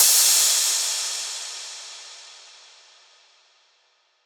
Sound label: crash one shot, Cymbals; GhostHack